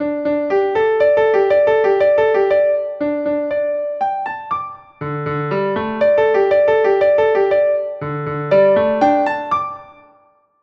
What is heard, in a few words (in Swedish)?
Jingel Dsus4; Jinglar flygelfonden